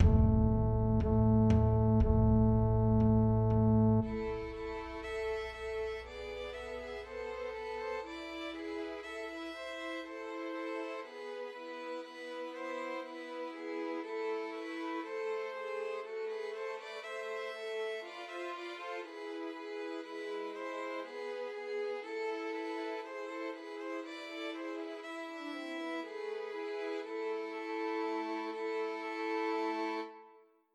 Pat a Pan Christmas Trios for Three Violins

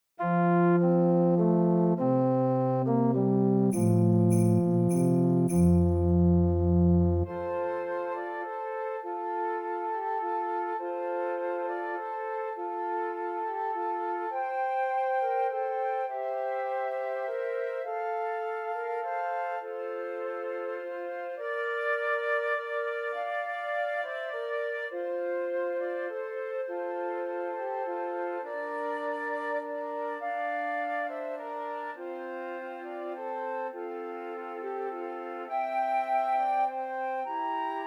Trios for Three Descant Recorders

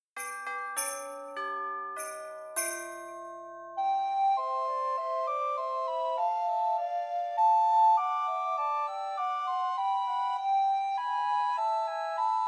Trios for Two Descant and One Treble Recorder; We Wish You a Merry Christmas

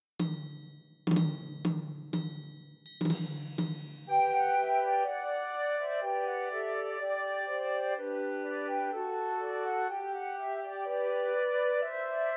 Trios for Three Descant Recorders; Christmas Trios

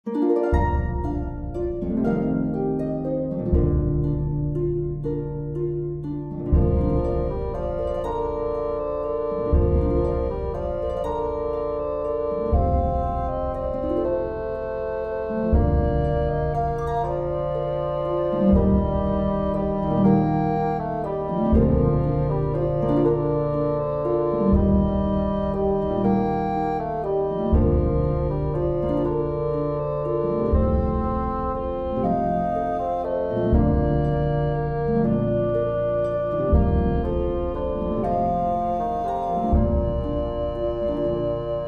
Tenoroon Track with Melody; Silent Night

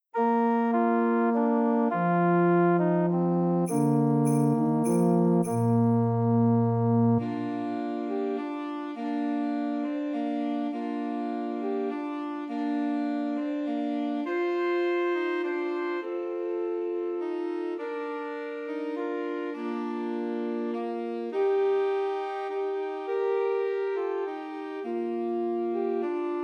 Trios for Two Altos and Tenor Saxophone; Christmas; Silent Night

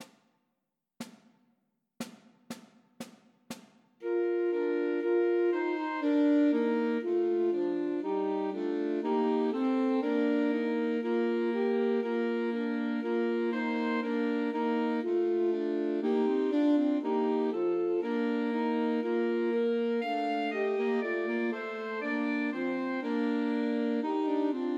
Trios for Three Alto Saxophones; Good King Wenceslas; Christmas

